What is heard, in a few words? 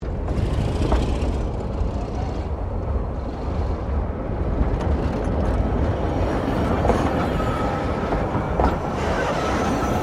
Train Diesel Shunter VA1 Class 1947 Tasmanian Shunting; Bellarine Tourist railway vehicles